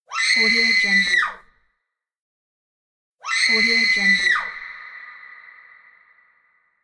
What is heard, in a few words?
scream